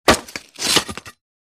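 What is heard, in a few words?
Tomahawk Single Impact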